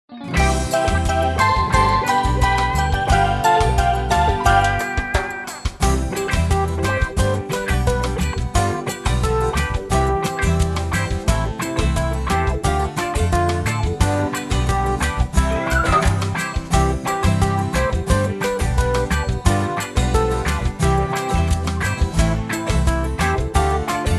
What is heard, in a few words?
Karaoke